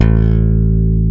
BASS A-1, BassGuitar; AKAI S6000 CD-ROM - Volume 1

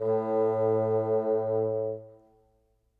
Bassoon, Orchestral; Sonic Foundry (Sony Creative Software); Orchestral VOL-2; Modern 11

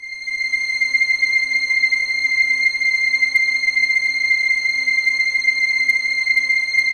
STRIN, SYNTH, Trance; AMG - Global Trance Mission VOL-2

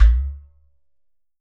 GHATAM

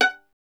STR VLN, Solo Instruments; Miroslav Vitous

